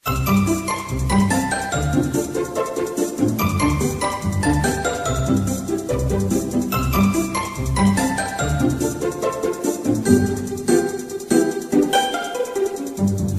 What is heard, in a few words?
menu theme; dragon quest